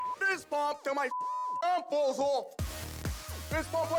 fist pump

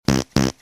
double raspberry fart

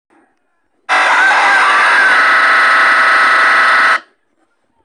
scary glicthy jumscare

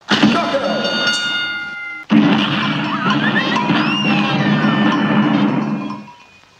knockout; cup head

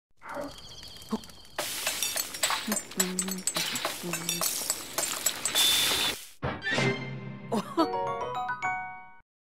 cooking normal; botw

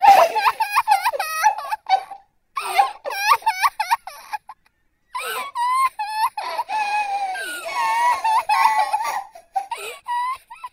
crying; garnet and ruby

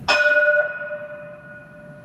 train bell

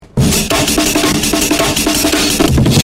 fast amen break

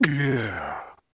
gggyeahhh low pitch

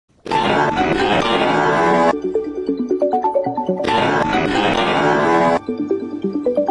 huawei ringtone

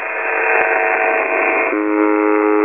the buzzer; uvb-76

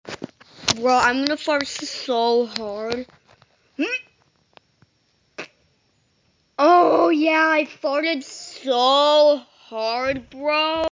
farted

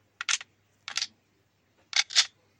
aks74u reload

